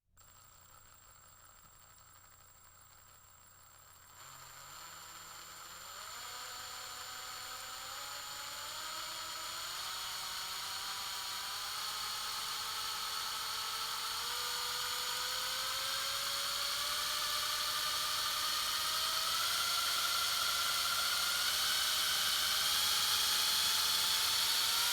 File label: medium drill long accelerating